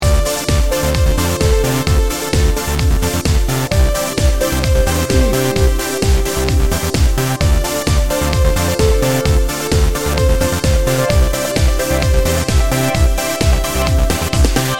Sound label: energy pulse loop